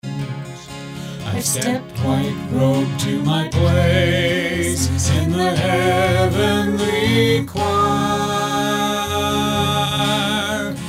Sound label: Up Here Singing